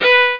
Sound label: viola